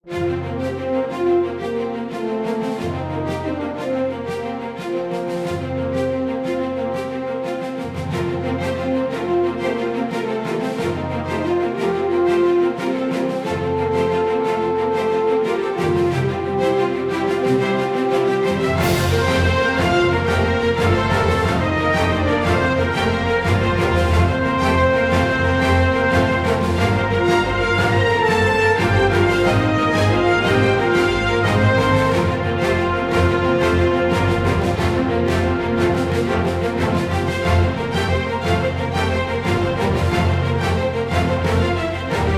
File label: Sports Orchestral